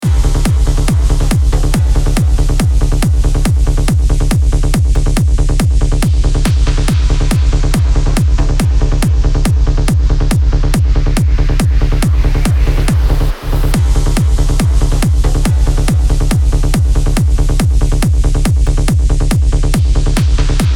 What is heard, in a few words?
driving triplet trance kick bass; Logic Pro X; AM Studios; Allan Morrow